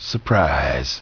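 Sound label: surprise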